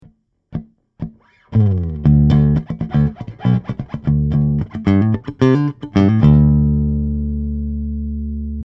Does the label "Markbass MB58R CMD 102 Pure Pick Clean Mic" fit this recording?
Yes